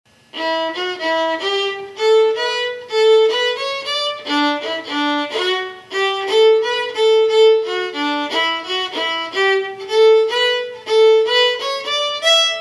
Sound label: Lannigans Ball slow